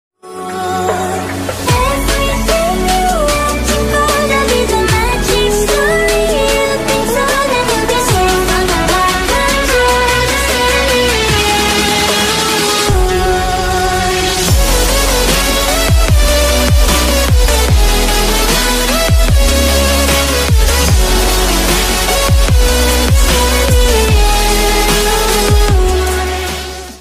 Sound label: ringtone bgm